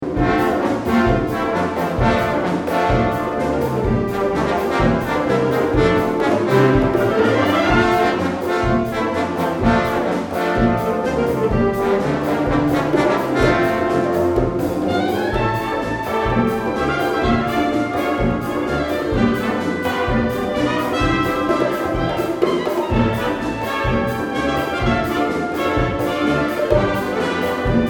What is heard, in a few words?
latin trombones